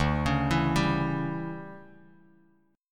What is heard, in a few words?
D Major 7th Suspended 2nd Suspended 4th, Bass Standard; arpeggiated